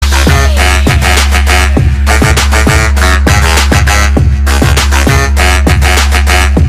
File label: bass drop songs biggest bass drops